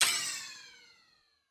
elemental pole cancel, puzzle; CrossCode

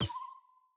highlight block, puzzle; CrossCode

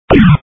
powerdown; demolition 2

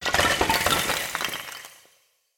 skeleton Long; halloween

